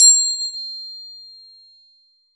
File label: crotale; Cymbals